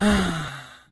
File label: zombie lady damage, monster